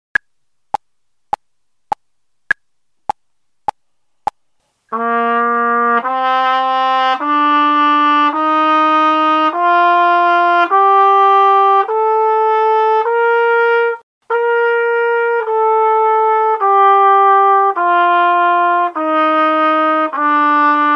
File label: Scale of C @102